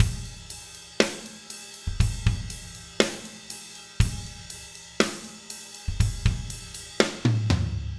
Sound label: 060 Ballad Drums Chorus; Pop Rock; Slow Tempo; Digidesign; Pro Tools; Pro Tools Essential Loops